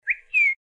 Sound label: Bird; Coolpad; ESurfing-No1-2021